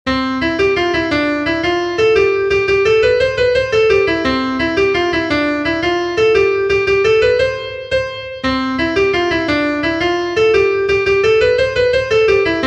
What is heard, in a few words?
JIG, fiddlers; ROCK VALLEY; Southern Kings Fiddlers; peifiddlers